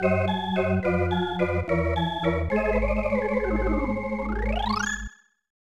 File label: Fall Over; Mick & Mack as the Global Gladiators; SNES